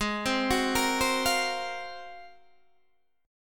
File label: Ab 13th down Guitar Open D